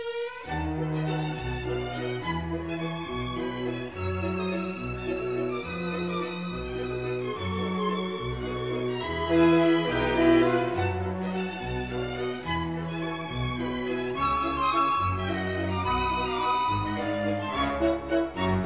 Divertissement for Small Orchestra Valse Animato assai